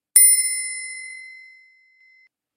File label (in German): Fahrradklingel Schmuckstueck